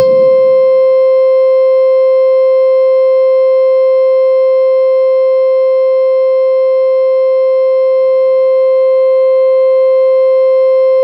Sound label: C5, SynthLine; 90 s Sample CDs; NiceBeats; SynthLine VOL-33